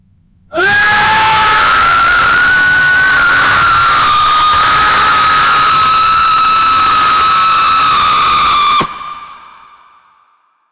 loud bass boosted

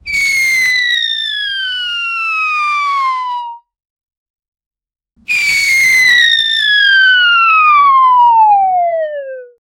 cartoon whistle fall, body fall; sound effect

